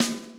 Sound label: Soft SN; osu